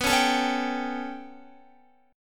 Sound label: B 7th Suspended 2nd Sharp 5th down Guitar, chord; B7sus2#5 strummed; JGuitar